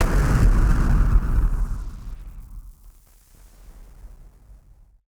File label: Party Popper FX